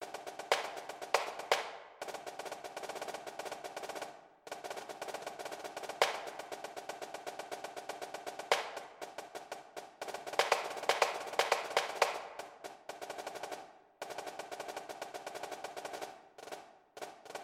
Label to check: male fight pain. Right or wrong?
Wrong